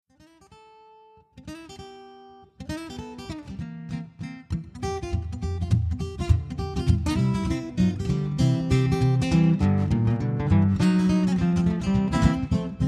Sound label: Shuffle